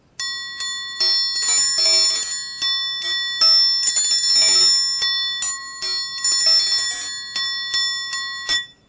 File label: triangle; western